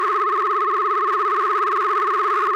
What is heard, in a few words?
Lazer Loop